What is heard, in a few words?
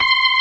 ORGAN ALL STOPS; Keith Emerson Signature Series